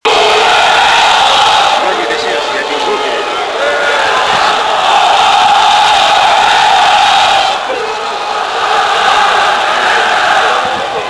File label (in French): tout le velodrome